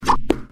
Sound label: Vacuum